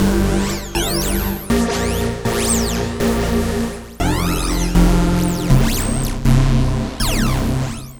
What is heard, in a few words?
Murlen Synth, Synth Textures; Sonic Foundry (Sony Creative Software); The Electronic Music Manuscript Collection